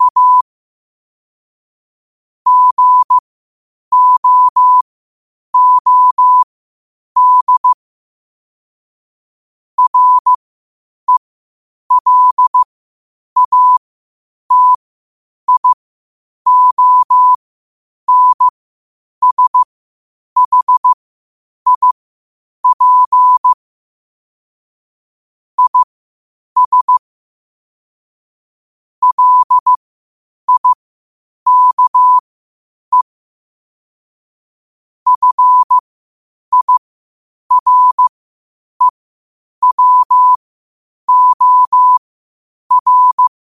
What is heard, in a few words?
5 wpm, morse